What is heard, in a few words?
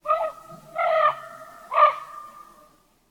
Rand Bird